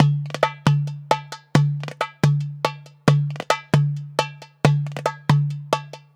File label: DERBUKA; USB Soundscan vol.63; AKAI